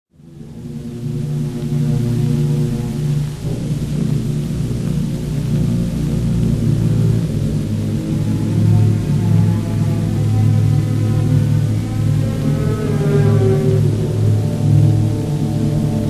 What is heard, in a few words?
for orchestra in D minor; A Faust Overture; WWV 59